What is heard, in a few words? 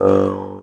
MT G, JHARP G B; Xsample VOL-9